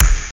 Hip Hop Bass Drum

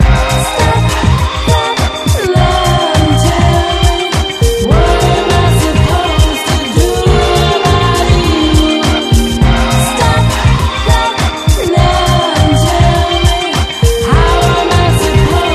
Modern Disco Pop

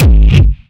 Compressed Kick

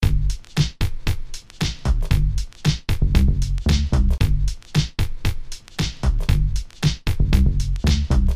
Hip Hop Funk Rinse

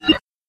IM Reply Sound

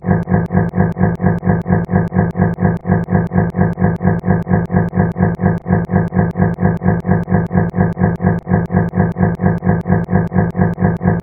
Machine Sounds

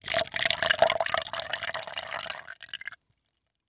Drink Poured Cup

